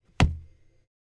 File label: chin sock metal